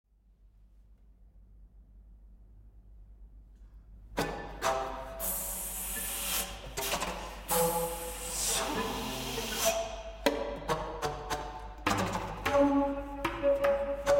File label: For Flute, Clarinet, Violin & Cello